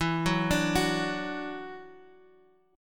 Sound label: E 7th Suspended 2nd, down, Guitar, Drop D